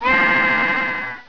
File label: Boy Fall; NinjaBoy